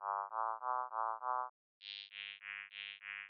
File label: pitch bright diff